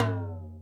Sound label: Toms, Drum Machines E-MU Pro-Cussion